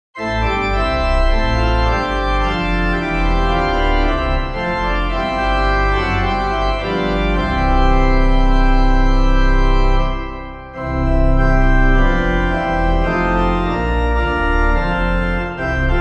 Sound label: The Church of Christ Cannot Be Bound, McKEE, organ, hymn